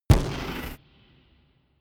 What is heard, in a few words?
gun pop gun shot; schusswaffen